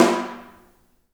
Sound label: HAIRCUTS, KENYA DRM, Heart of Africa; Spectrasonics